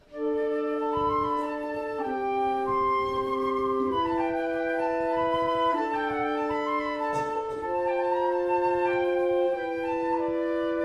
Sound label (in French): Flutes